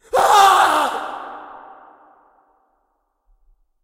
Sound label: Attack; SCP 939